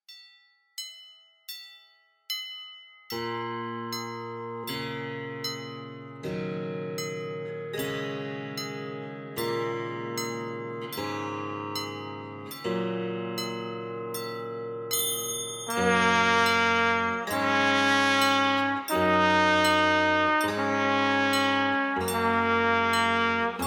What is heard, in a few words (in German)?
Abendlied ohne Worte